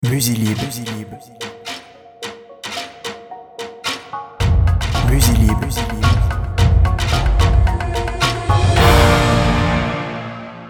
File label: cinematic trailer